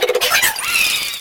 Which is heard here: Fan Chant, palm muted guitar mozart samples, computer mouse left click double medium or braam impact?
Fan Chant